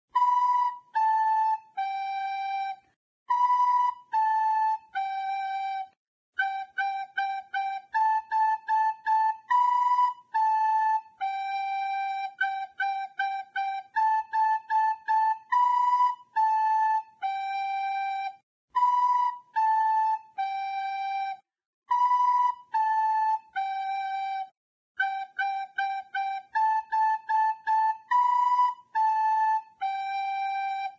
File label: Flute Hot Cross Buns; Instruments; Zomboid; Lifestyle